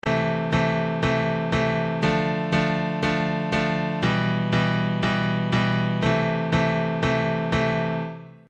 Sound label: Am Dm Em Am